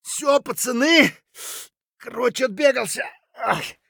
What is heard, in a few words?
hurt near death; Escape from Tarkov; scav6